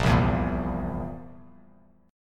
A sharp Suspended 2nd Flat 5th A sharp, Bass Standard; strummed; A#sus2b5; JGuitar